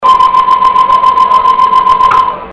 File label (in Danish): s tog trille